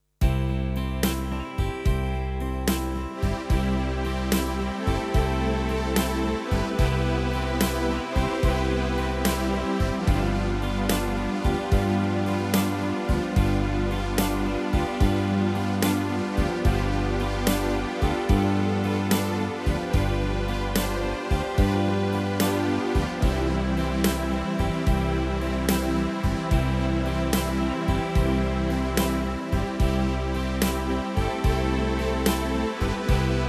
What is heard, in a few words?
Praise Ye The LORD Slow Four